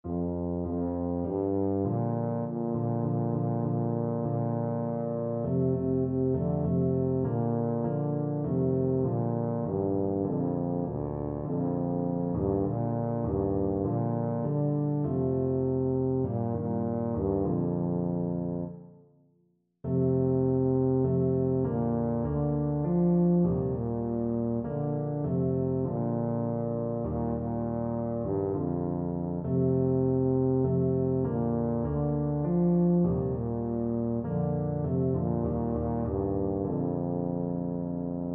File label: to the river, tuba